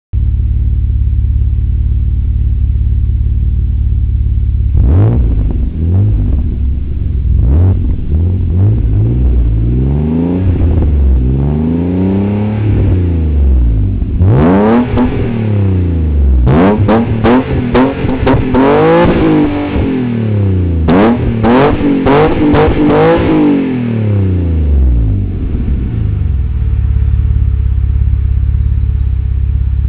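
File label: gtv, Alfa Romeo GTV; auspuh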